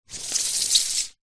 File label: cd disc slide on wood surface